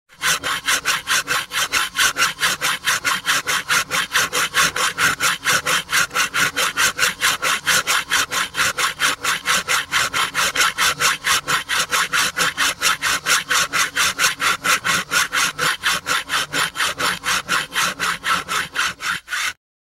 Hand Saw

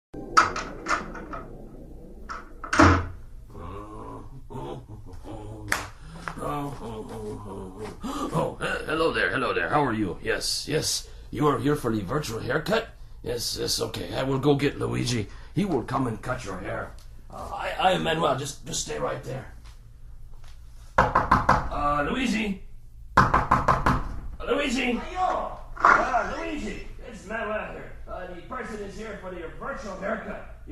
Virtual Barber Shop 8D sound

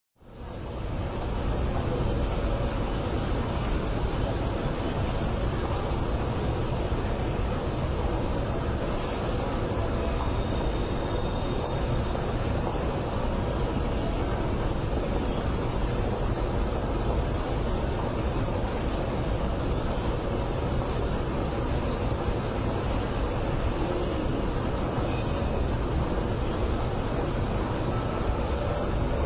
train on tracks moving

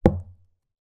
dart

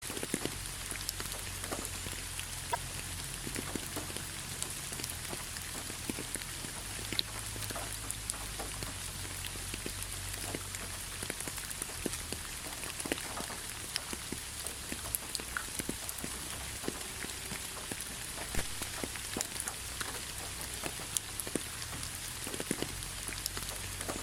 Gentle Rain Sound

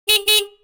Car beep

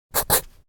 pen check sound

